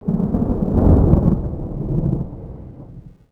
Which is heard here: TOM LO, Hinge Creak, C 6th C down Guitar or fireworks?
fireworks